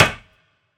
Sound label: auction hammer